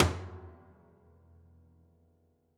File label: Body Thump Sgl, Piano Destruction